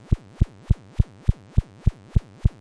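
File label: engine loop, spacecraft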